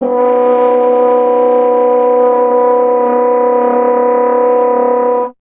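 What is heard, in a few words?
BRS French Horn, Solo Instruments; Miroslav Vitous; Solo Instruments VOL-2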